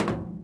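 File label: trapdoor walk, footsteps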